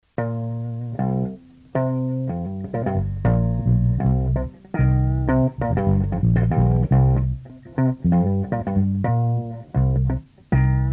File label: Blues Funk